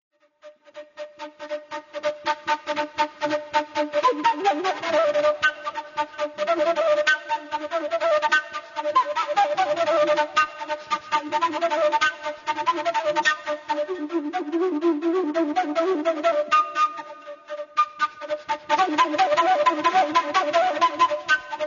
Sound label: solo